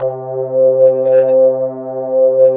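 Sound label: synth, 150 BPM, drum & bass; Ueberschall; Drum & Bass Resonance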